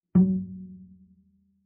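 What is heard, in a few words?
Bass pizzicato fortissimo sul A Gb3, Double Bass; MIS Pitches - 2014; Strings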